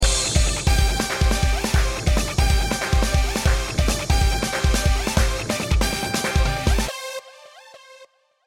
オープニング、ハイテンションBPM140

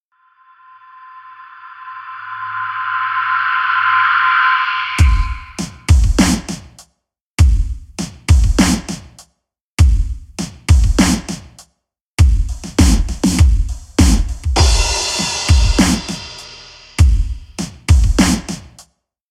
ジングル 大地との共鳴 BPM100

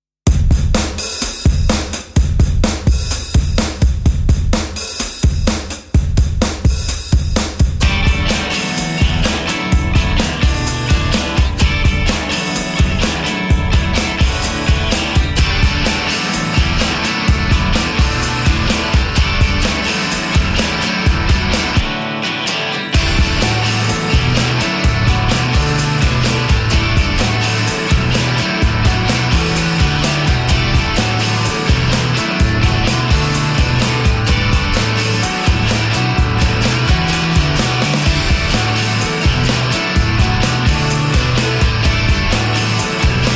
ロックループBGM BPM127

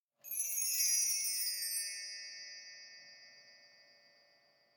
ウインドチャイム ショート

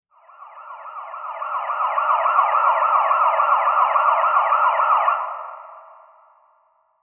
サイレン （遅）

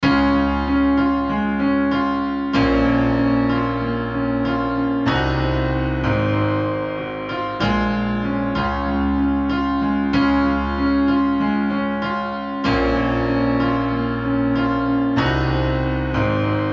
ジングル 洗練、清流 BPM95

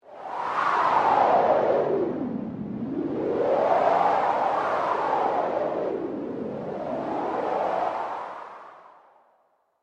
風の音

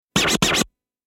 スクラッチ 高音

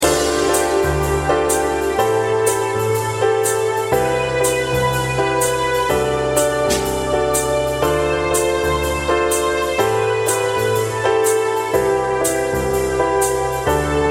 ジャズループBGM 夜の街並み BPM123

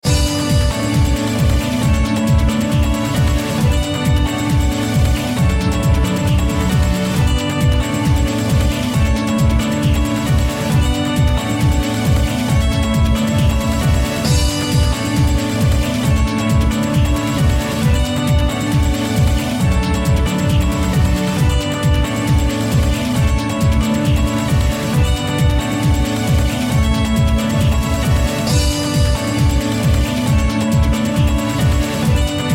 ジングル 疾走、軌跡 BPM135

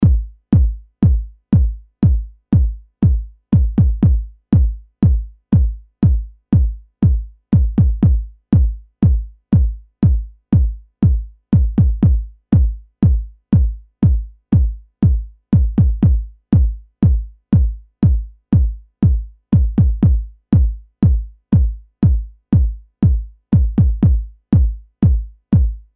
シンプルドラム BPM60

